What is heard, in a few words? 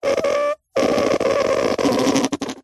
leisure whoopee cushion squeeze fart strained whine long